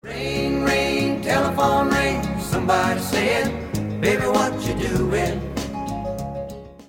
ring country ringtone

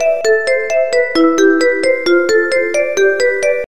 90s rhythm riff ringtone

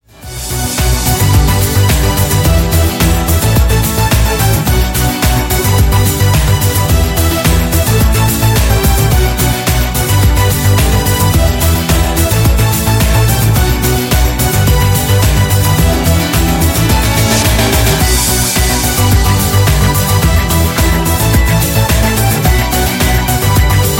instrumenta ringtone

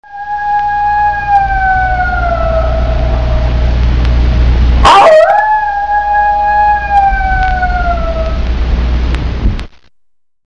horror sms; android

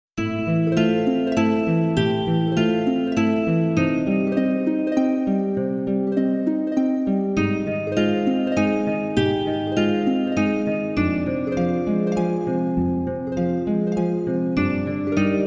emotional videogame ringtone

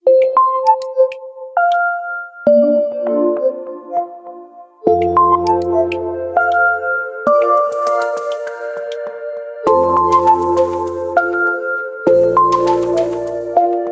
twinkling stars ringtone; oneplus

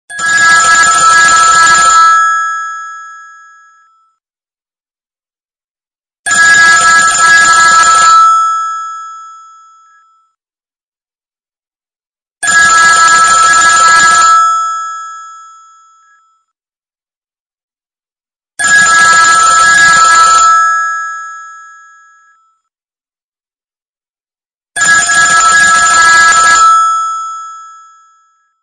old american phone ringtone; android

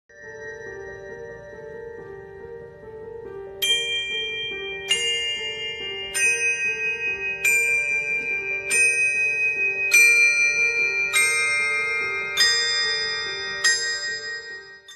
bells

